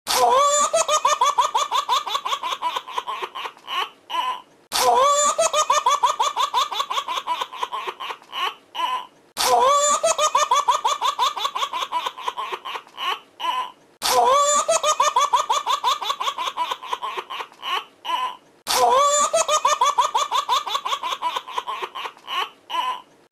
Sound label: Baby Laughter